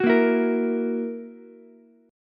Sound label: E Suspended 2nd Flat 5th E down Ukulele; strummed; JGuitar